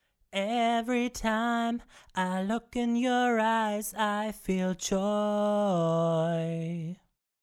Melodyne 5 Vocals Tonhöhenschwerpunkt 100

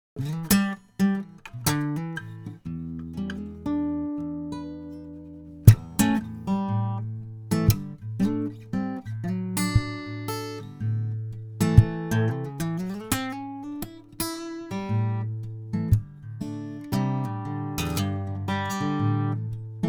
Gitarre

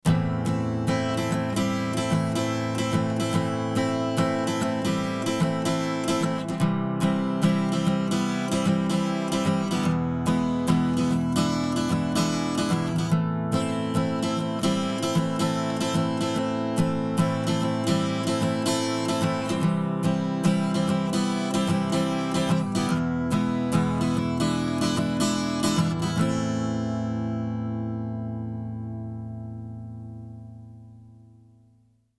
Chandler Limited RS124 Western Gitarre; 600 Ohm

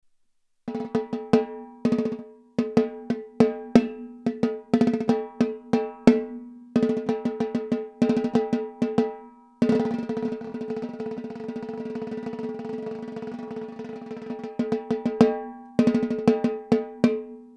Tama LMB1465 hoch

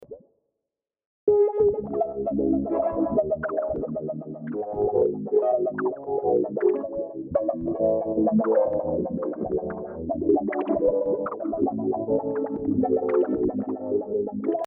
Klassik Piano

